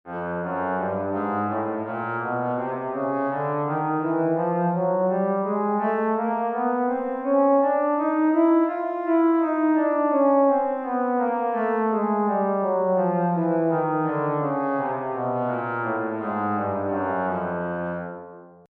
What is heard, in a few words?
chromatic scale trombone baritone